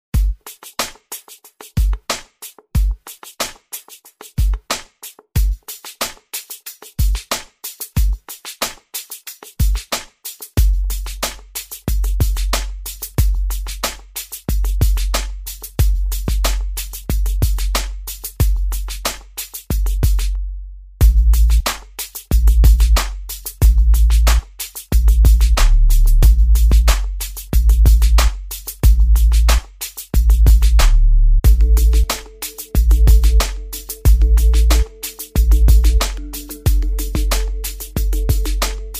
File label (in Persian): بیت Melody